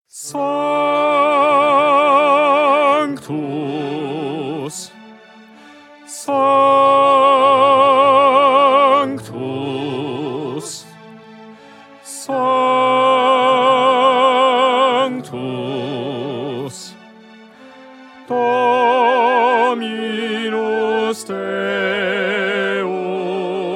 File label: Bass Sanctus; 2020 Mozart Requiem